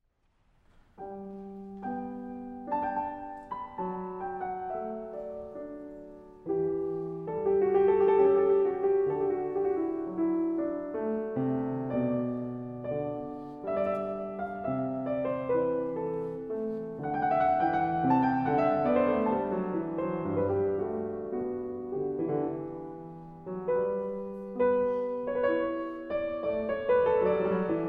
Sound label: Classical Instrumental